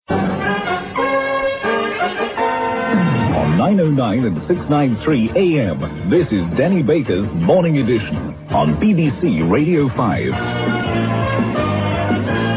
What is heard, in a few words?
jingle